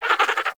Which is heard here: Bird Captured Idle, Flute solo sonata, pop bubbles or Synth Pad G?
Bird Captured Idle